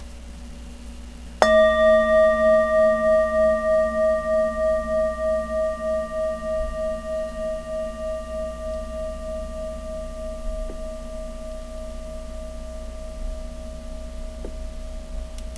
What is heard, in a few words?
large tibetan singing bowl